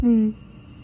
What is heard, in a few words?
missed meter